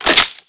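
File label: weapon shotgun double reload open; doom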